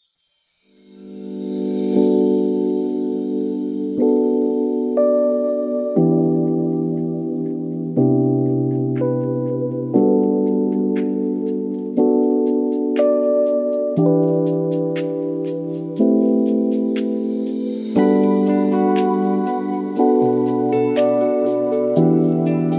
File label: Whispers of Rain and Vinyl